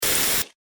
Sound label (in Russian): obychnyj zvuk pomehi